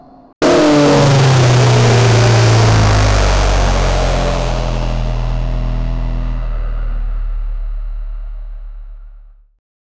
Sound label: very loud modified diesel